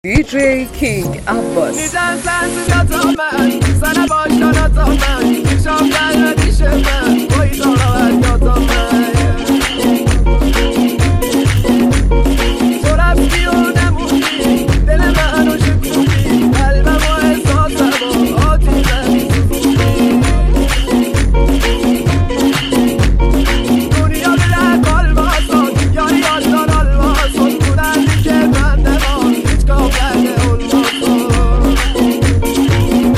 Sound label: remix remix